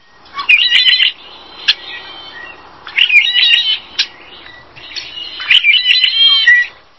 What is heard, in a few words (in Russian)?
trojnoj povtoryayushijsya oglushitelnij shebet